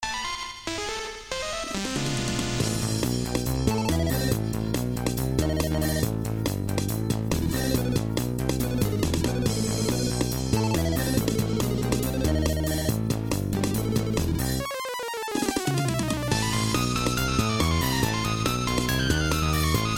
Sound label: Ballad; Terraria; Thorium Mod